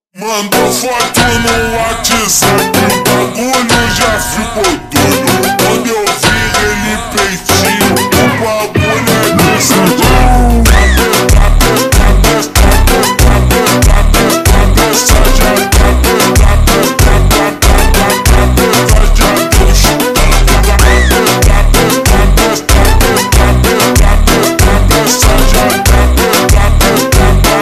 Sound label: Montagem super Slowed